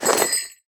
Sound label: armor heavy; smite; chiron